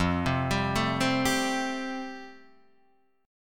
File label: F Major Add 9th, Guitar Open D